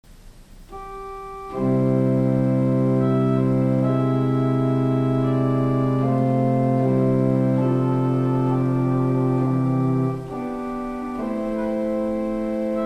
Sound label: Organ Swell Viola de Celeste